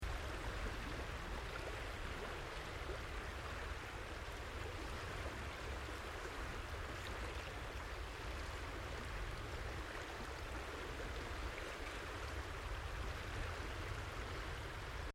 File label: river; ibaragi